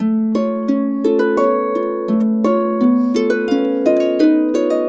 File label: A 84, Complete Harps; USB Soundscan vol.62; AKAI